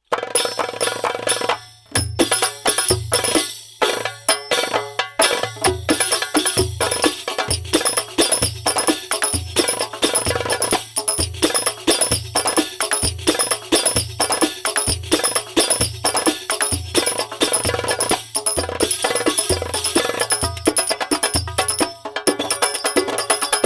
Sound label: darbuka show; by burak sound bank; darbuka ritim paketlerii vol 1